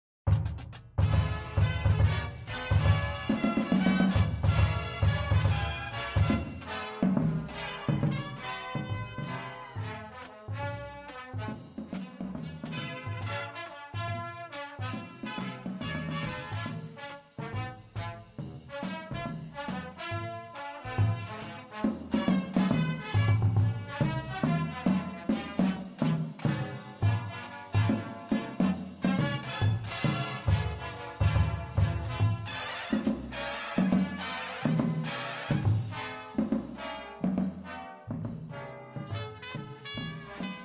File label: bass with music, drumline